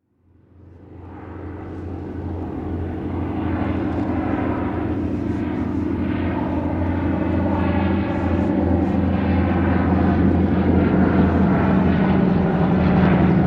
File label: propeller plane fly over